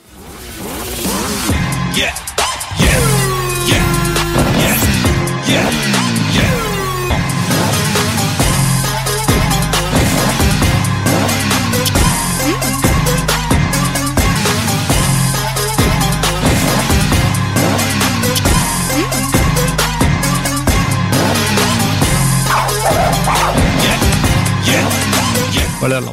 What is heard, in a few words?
Movie Ringtone; Bhairava